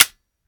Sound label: fire dryfire; gmod; viper